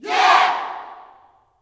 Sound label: "Yeah" yell shout, gospel; Spectrasonics; Vocal Planet Vol.1 Gospel; akai